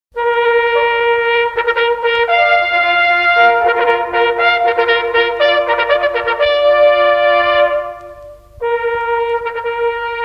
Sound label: Band